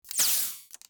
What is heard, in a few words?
hypospray, medical devices